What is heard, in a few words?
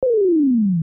Line graph decrease animation infographics